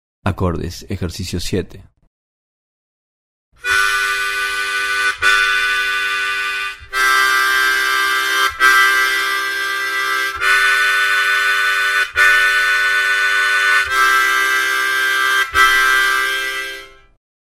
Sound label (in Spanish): Acordes Ejercicio